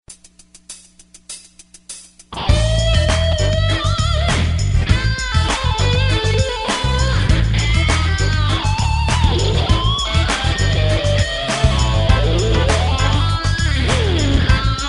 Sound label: Solo improvisation; assoli e improvvisazioni varie